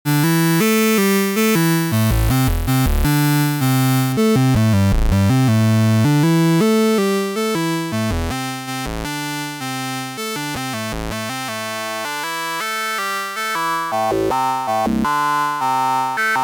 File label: Beepstreet Dagger HPF plus Drive